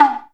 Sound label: percussion, Ethnic Perc